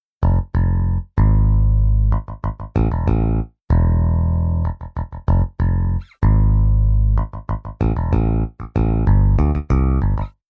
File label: Bass